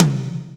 TOM TOM, Roland

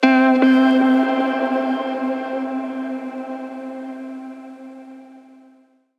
synth note fragile C; Virtual Riot; Synth Notes & Chords

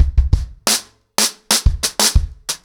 90BPM, ACOUSTIC DRUMS

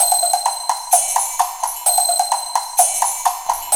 BEL WUD BLOK, CHINA; Zero G - Ethnic; CHINA ORCHS

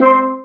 STEEL DRUM; AKAI S-Series CD-ROM Sound Library VOL-8; PERCUSSIVE

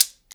MARACA, Percussion; InVision Interactive; Percussion Slam; BOLERO

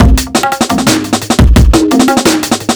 E Beat, Electronic Beats

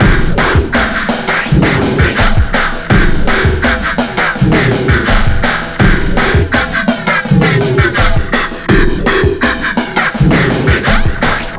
WEIRD BEAT, 83 bpm, Breaks; POTBreaks

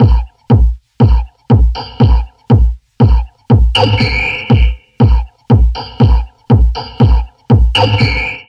Drum Loops Crazy Processed

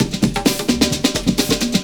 130 addon, DRUMLOOPS, HIHAT; Ueberschall; Techno Trance Essentials

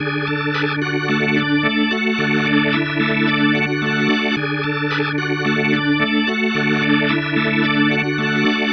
Psychedelic, Organ, Loops; Sonic Foundry (Sony Creative Software); Joe Vitale Organ Donor

